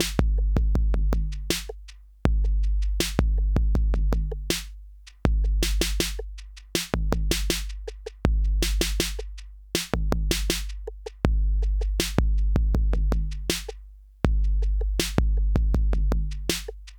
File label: Chandler Limited Zener Bender Hardware 808B LIMIT SC OFF; Softube